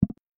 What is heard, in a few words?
img btn click